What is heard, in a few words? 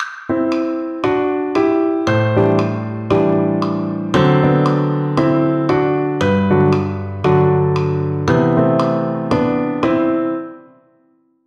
Cocktail piano arranging